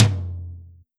Yamaha RM50 Mid Tom

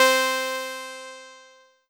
Roland JX-03 Harpsichord C4

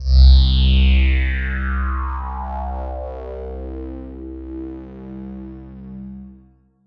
Korg 01W Bellevue C2

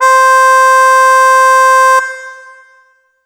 Yamaha EX5 Mouth Keys C5

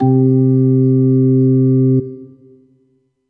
Yamaha EX5 Jazzy Ballad C5

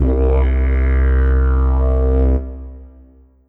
Ensoniq ZR-76 Didjeridu

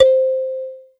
Casio CTK-611 Kalimba C5

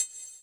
Kawai K11 Mute Triangle

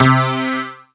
Roland U-110 Detune Piano C3

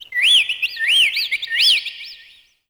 Yamaha TG100 Tweet

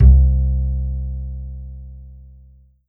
Alesis Fusion Acoustic Bass C2

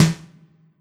Yamaha FS1r Drum Kit Snare

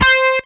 Casio CTK-611 Charang C5

